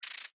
Insect Rattle Shake, Insect, Rattle, Shake, Bug; Blastwave; Animals:Insects

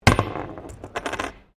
Coin Penny Drop Wood, Old Australian pennies; Money; Sound Effects:Objects

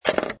Pinball sound, metal clank with return; Video Games

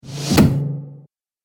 Cinematic Suction Hit, trailer sound effects

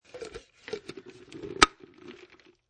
Drink Close

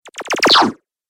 8 Bit Style Mini Spaceship; Video Game Sound Effects

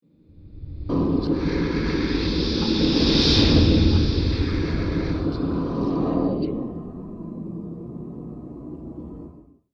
Erie Space Ghost, Science Fiction; Blast Wave

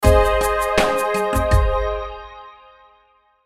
Musical Short, Musical Short Beat Drums Shimmer; Musical Sound Design; Blastwave

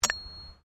Digging Small Handheld Tool Rocks

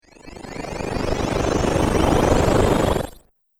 Storm Whoosh; sound design; Whoosh Sound Effects; Swish, Zoom, Swipe, Whoosh, Swoop, Transition

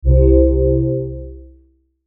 Logo Swell Soft Pad; Logo Swell Soft Pad Low; Sonic Logo

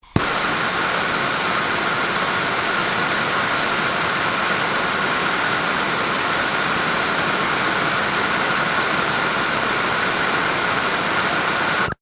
Propane Hand Torch; Machinery; Machines

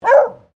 ANIMAL DOG BEAGLE FEMALE BARK

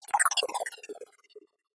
Scanner Ray Sweeper, Scifi, Alien; Science, Fiction; Tech; BlastWave

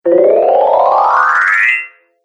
Magical Cascade Up; Magic Sound Effects

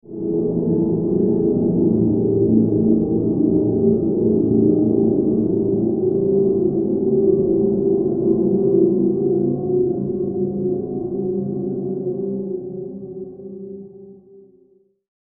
Soul Survivor Horror Scene, scary soundscape; horror sound effect

